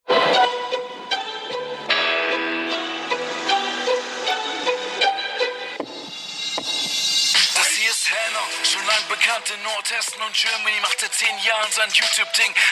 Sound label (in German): rap